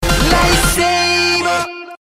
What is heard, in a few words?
Lightsaber